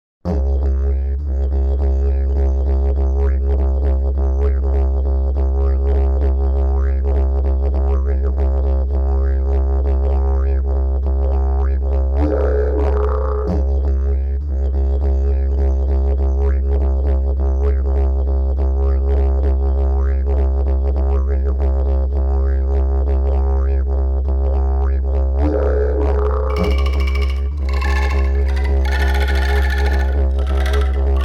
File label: Didge